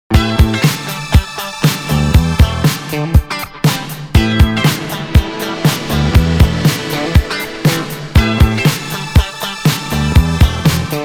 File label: french boogie